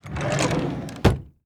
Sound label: Drawer Open Kitchen; HauntedBloodlines; SFX Producer